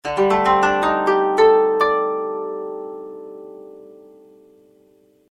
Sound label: Koto